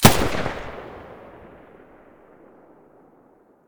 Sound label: muzzle ump45 mono, weapons